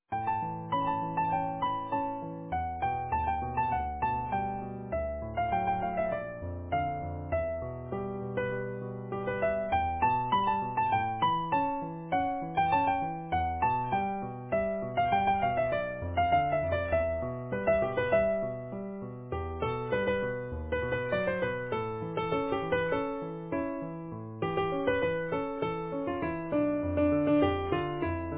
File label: easy piano